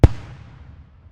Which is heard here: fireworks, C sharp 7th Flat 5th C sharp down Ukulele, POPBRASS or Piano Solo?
fireworks